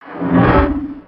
minifig warp

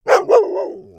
doberman bark

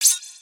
Thwok slash; Summoner

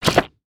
Stink Bomb Fish Flop

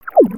Bubble

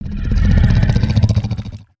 howl, creatures organic

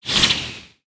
Spinjitsu Ice Shuriken Project

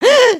gasp

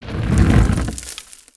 Pillar Crumble

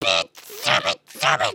collapse

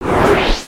Text Whip By; aronwk collection; ndaudio

